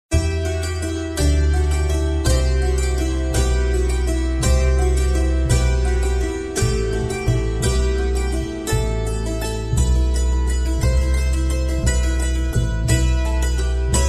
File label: Carol of the Bells Hammered Dulcimer Version